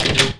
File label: woodbox